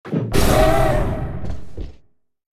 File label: Shout